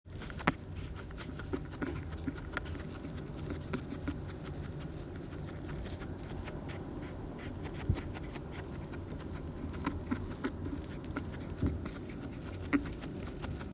nasal, ※チモシーを咀嚼している音声がベースに入っています。時折「ぐっぐっ」という低い音が確認できます。; 「咀嚼中に喉が鳴っている音」だそうです。; 1歳11ヶ月29日; guinea pig; モルモットの「鼻に異物が入った時の音声」だと思っていたら「咀嚼中に喉が鳴っている音」だそうです。